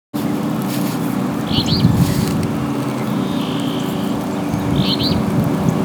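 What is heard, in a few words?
Alder Flycatcher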